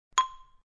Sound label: XYLO 3; UISound